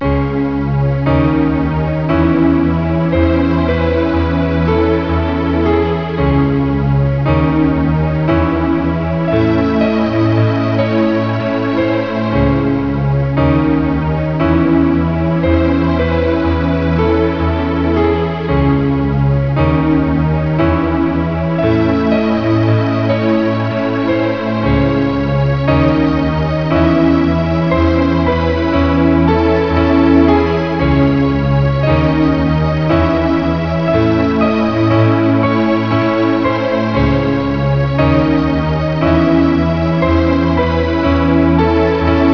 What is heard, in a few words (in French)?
musique